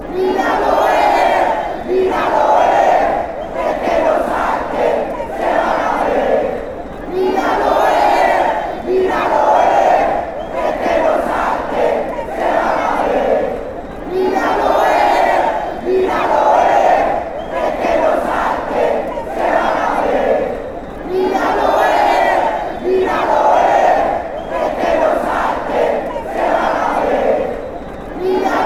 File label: chant, deportivo cali